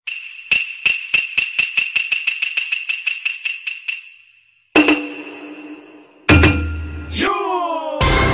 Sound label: Kabuki Yooo Sound effect; Kizunami